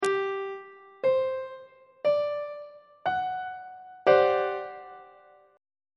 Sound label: f double sharp major 7th suspended 4th chord; on treble clef